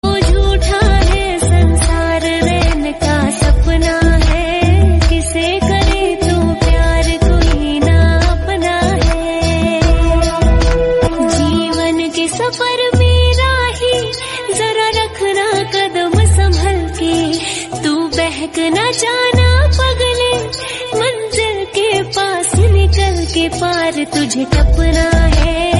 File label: Krishna Bhajan Hindi